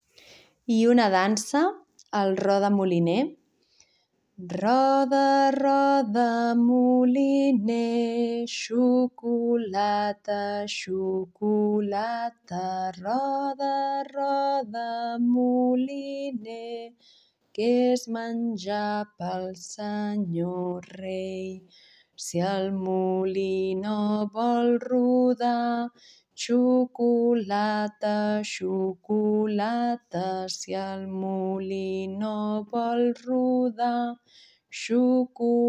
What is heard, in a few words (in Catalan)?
Roda moliner dansa